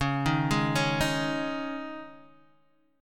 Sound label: Db Suspended 2nd, Guitar, Open D